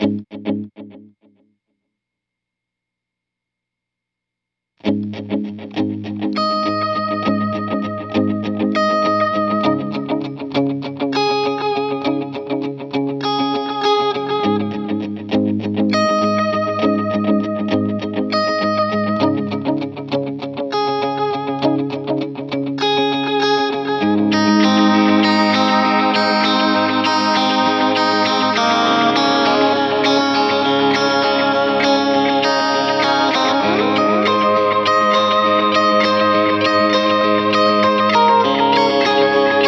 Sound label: Delay Vintage 8ths